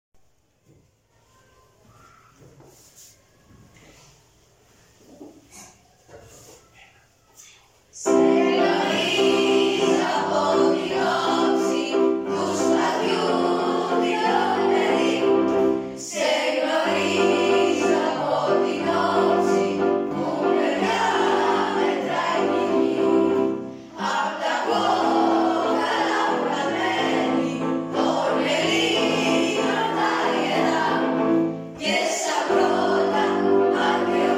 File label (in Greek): Εθνικός Ύμνος